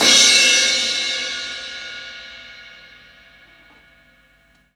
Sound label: CYMBAL, CRASH; Sonic Foundry (Sony Creative Software); Drum Tools; Laidback